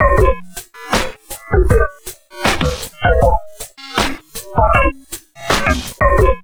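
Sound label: drum loops crazy processed, 80 bpm; USB Soundscan vol.07; AKAI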